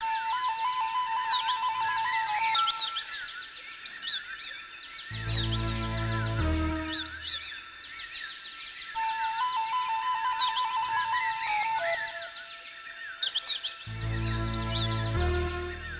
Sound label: Ringtone